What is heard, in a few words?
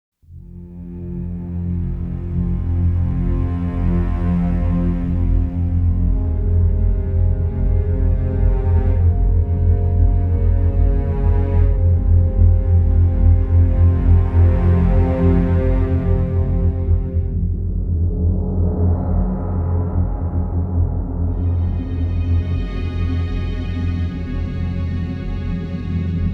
RED ALERT; front mission 4 plus 1st original soundtrack